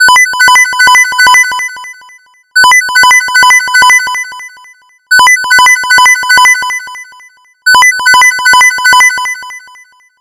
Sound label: Sound Echo; Avira